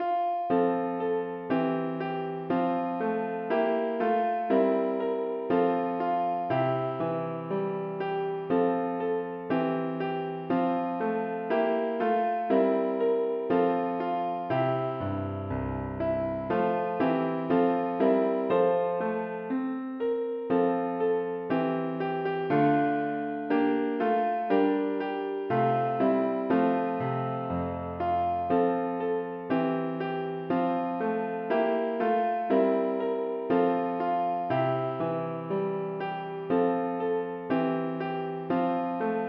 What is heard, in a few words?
Piano